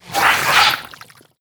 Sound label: Scorpid Attack